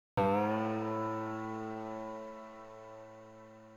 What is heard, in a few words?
ethno, 125, A